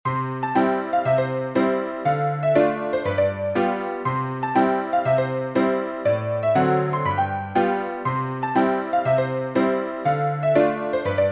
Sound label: piano, sheet music